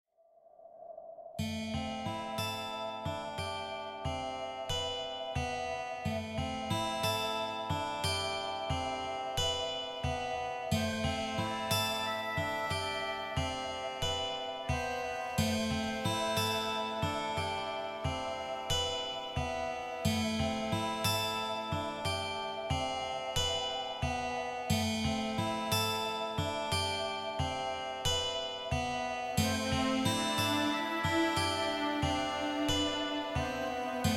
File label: Fairytale Atmosphere Tune